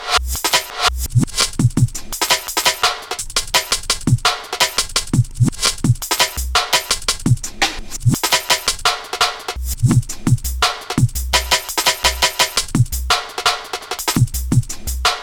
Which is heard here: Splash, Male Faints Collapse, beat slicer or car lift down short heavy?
beat slicer